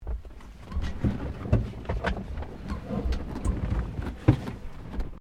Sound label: CAR HOLDEN FJ UPHOLSTERY MOVEMENT, Classic Australian cars